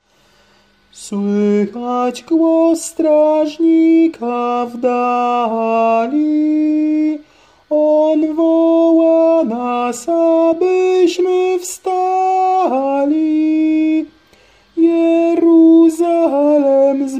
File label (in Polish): Słychać głos strażnika tenor